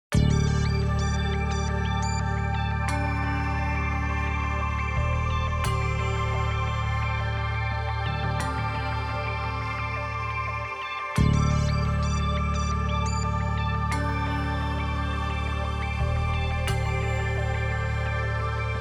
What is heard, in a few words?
Social Documentary